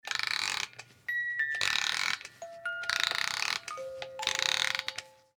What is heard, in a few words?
music box winding up